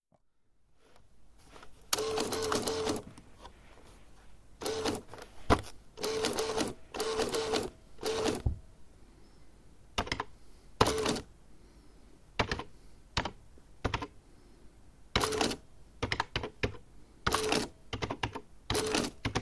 Geräusch Rechenmaschine

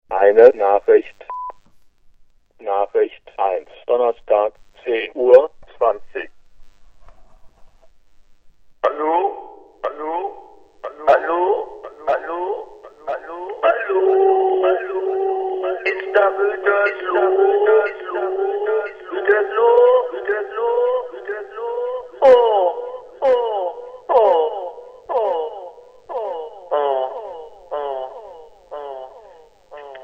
Anrufbeantworter

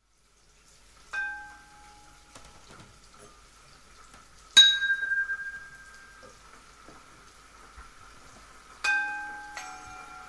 Küchenklang

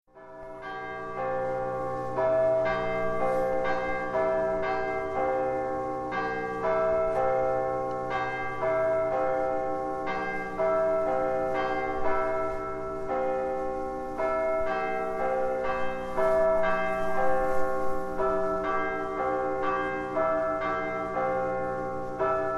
Nachtsanggeläut

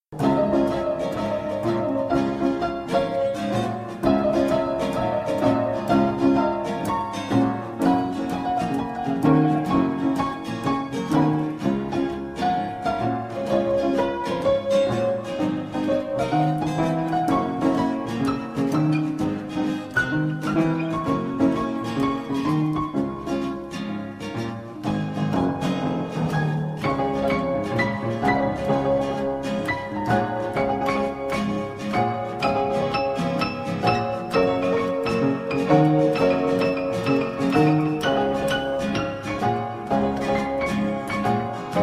Trio A blues klavier

